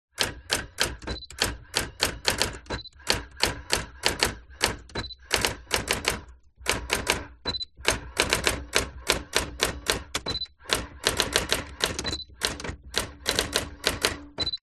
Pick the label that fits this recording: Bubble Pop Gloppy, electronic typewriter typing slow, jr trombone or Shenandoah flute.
electronic typewriter typing slow